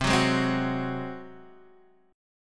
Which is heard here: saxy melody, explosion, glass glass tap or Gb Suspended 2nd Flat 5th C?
Gb Suspended 2nd Flat 5th C